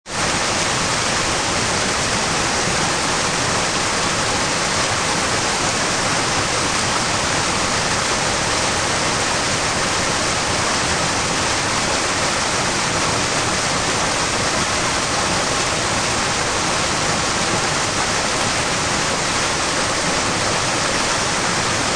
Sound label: Waterfall, High quality upclose recording of a mountain waterfall.; Rivers; Nature